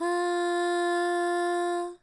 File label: Choir; osu